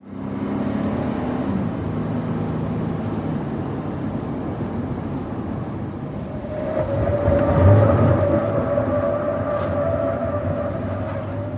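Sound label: SUV Truck Accel Corner Interior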